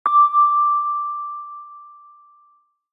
Flight announcement tone airport departure single beep